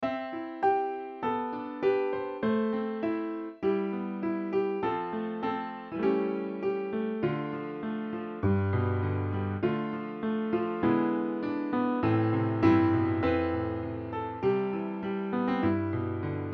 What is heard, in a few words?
piano